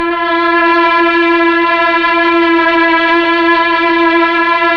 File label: STR, Melo. Strings, Slow Strings; Roland LCDP09 Keys of the 60s and 70s 1